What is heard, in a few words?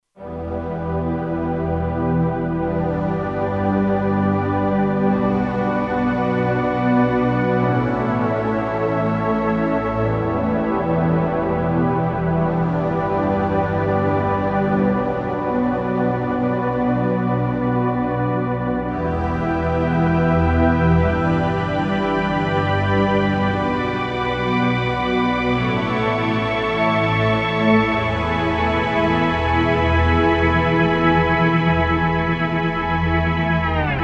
Digital Choir, Kurzweil K2500X; Factory Programs